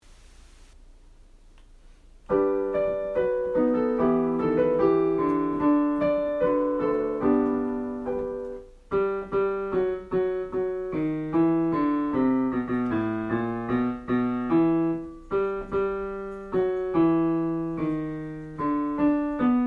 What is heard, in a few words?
Bottle Salvager Bass; Accompaniments